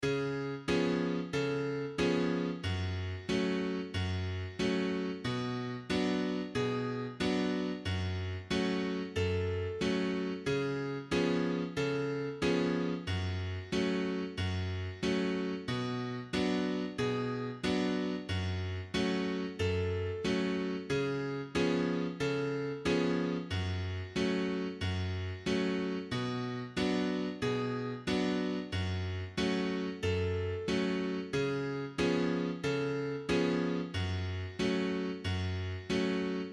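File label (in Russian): Chastushka minor F sharp minor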